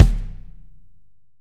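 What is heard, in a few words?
AMB KIK, ambient kick, studio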